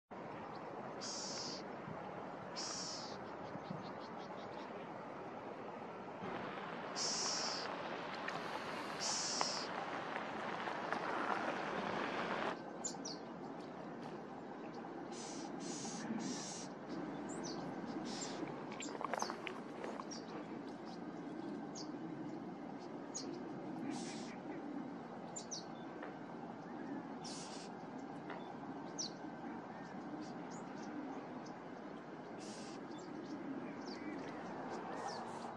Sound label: Black-tailed Gnatcatcher its calls; desert; usa; caught my attention